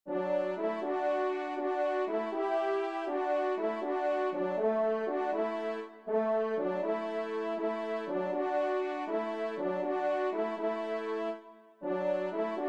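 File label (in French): paroles et musique, ensemble, trompe cors; L'Elagueur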